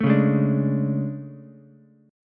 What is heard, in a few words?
A Major 11th, down Ukulele C6; AM11; strummed; JGuitar